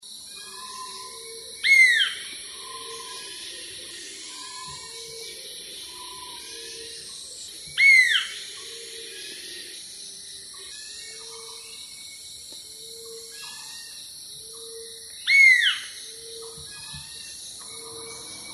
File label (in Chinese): 八色鳥; 仙八色鶇; 八色鳥科/夏候鳥